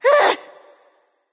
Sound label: ugh; smite; artemis